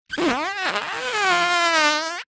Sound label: cartoon fart